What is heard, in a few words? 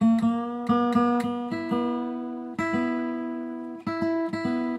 music gtr